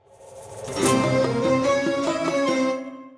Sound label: free spin init; Amatic; Enchanted Cleopatra